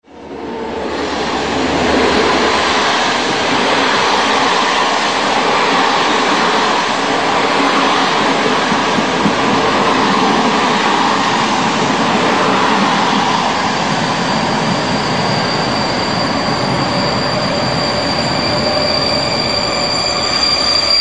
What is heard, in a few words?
brake